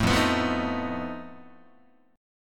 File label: F 7th Flat 5th F down Guitar; F7b5; strummed